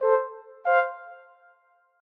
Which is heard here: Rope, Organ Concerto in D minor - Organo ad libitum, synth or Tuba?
Tuba